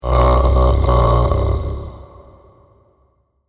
Zombie Out Of It; Horror; Zomby Sound Effects

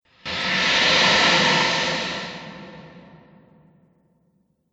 Fire Breathing Monster; Monster Sound Effects

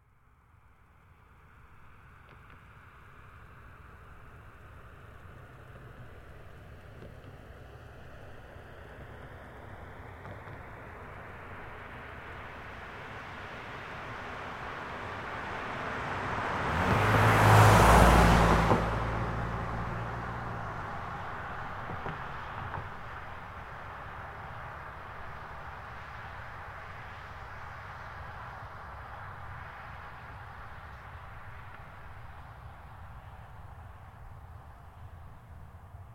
Car Highlander By Med; 2005 Toyota Highlander V6; Cars; Vehicles

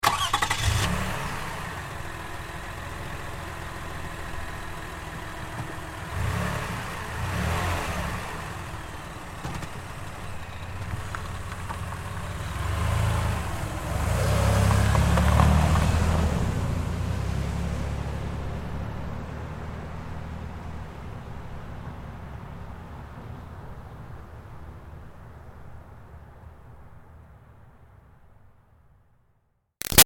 Car Highlander Start Away Slow; 2005 Toyota Highlander V6; Cars; Vehicles; Big Room Sound

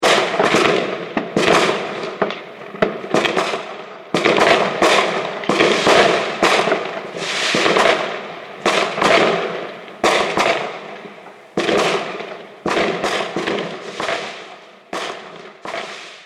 Fireworks Huge Bangs And Crackles; Celebration, Party, Holidays; Andrew Fleming

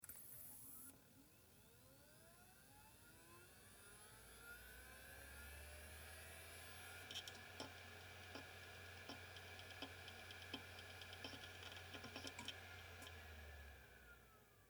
Technology Hard Drive Boot Up External; Computers; Electronics

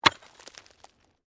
Digging Pick Hard Earth

